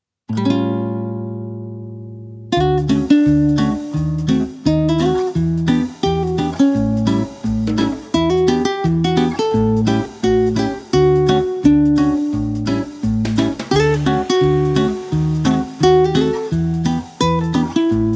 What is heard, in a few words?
jazz